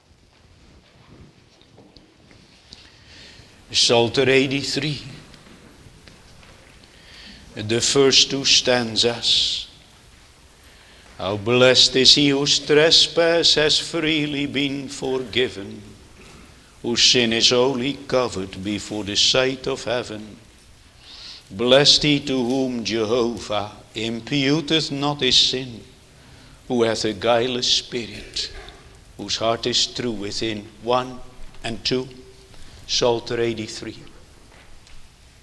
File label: Singing; Jesus' Mercy to One Taken with Palsy (2); Netherlands Reformed Congregation, St. Catharines, ON